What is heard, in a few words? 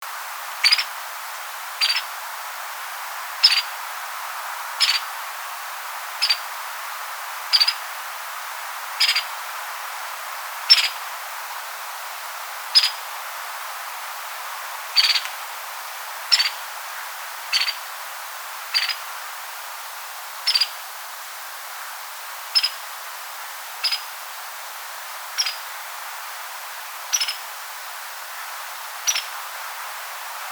White-headed Woodpecker Leuconotopicus albolarvatus